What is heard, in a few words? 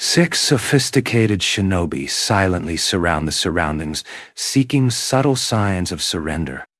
pain; XTTS-v2